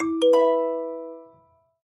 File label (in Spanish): tono; samsung a50